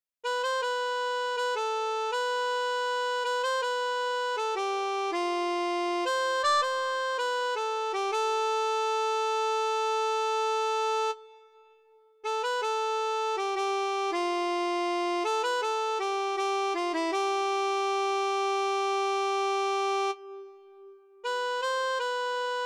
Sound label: harmonica